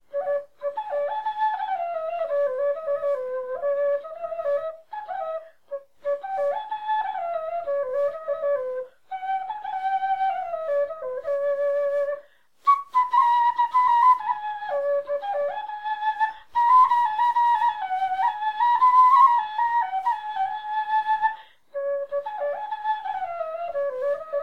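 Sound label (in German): melodie, Knochenflöten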